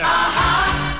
AHAH

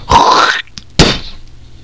SPITTY

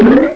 doink

